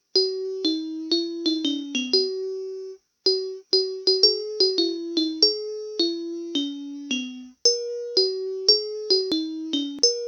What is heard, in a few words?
raindrops, chimes; chimecollector